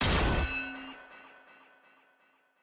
parry; samira

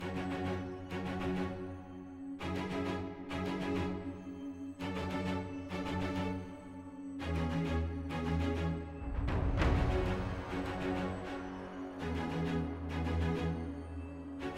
mood loop triumph; lol

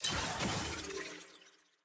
skin click; gwen

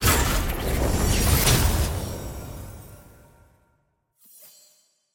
sfx tier wings promotion; iron; ranked

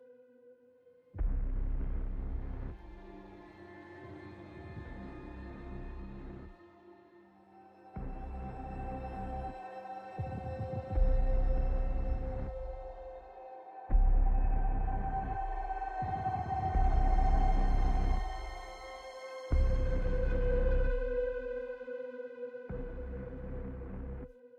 mood loop tense

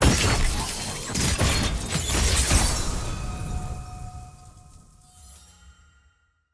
tier wings promotion to platinum; ranked

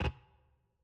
ui gameplay skill not ready

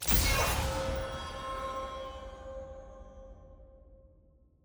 ui button click

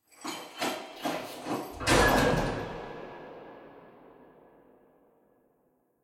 curious

